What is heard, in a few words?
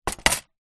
broken picture frame containing loose glass shards place down on wooden tab; table; zapsplat